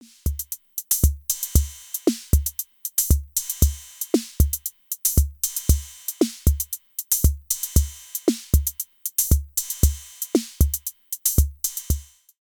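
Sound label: RND Portico 535 TR606 Comp OFF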